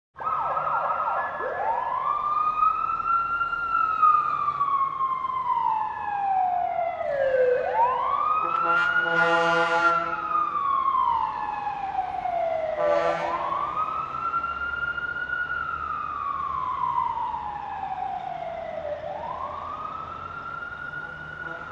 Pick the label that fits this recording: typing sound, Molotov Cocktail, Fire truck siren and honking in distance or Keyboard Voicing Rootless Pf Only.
Fire truck siren and honking in distance